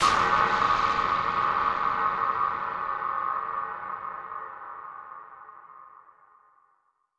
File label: shock